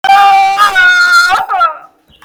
dying; shrek

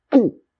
cartoon gulp swallow sound

